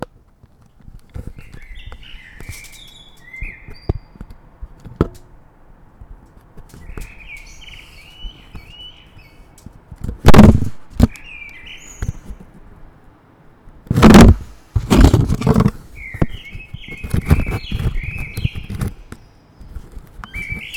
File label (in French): Rush Merle